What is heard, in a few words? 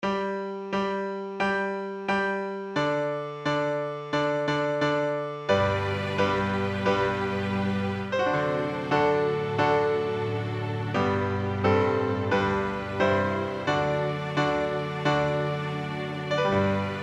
Lightly Row 88bpm